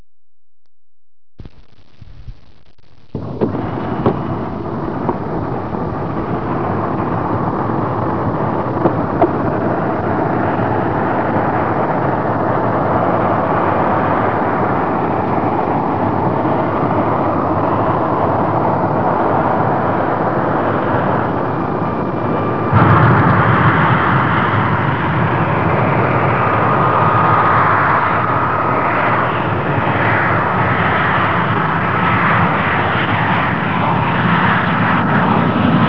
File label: takeoff long, f-106 delta dart